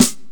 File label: smooth steel snare drum b key; Duration 0:01; Channels Mono